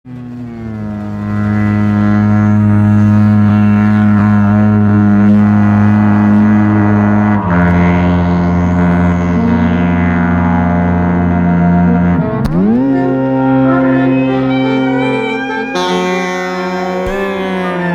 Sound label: sax manipulation room